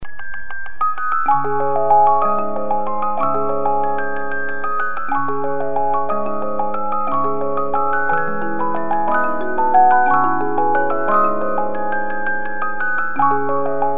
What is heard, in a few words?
Fur Elise, 50 Note music box movement